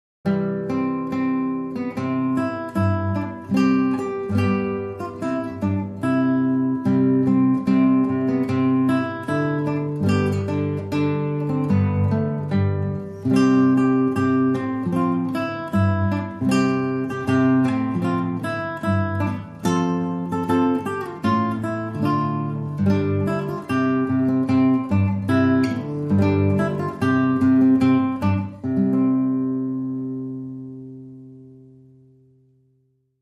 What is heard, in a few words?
Solo Guitar